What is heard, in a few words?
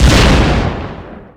Bomb daruma Skill Dead